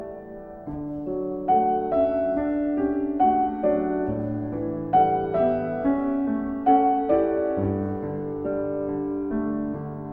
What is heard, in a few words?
piano